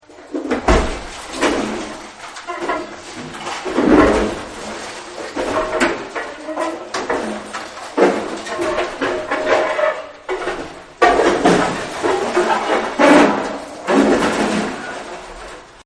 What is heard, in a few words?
Pulling It Down, smash; Smashes; Objects; Partners In Rhyme